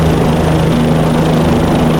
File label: helicopters; cs2d; cityrp